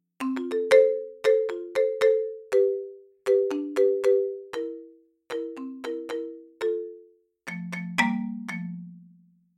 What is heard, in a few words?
Marimba